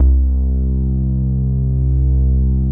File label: BASSES